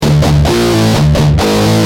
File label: G Jam, Guitar; Industrial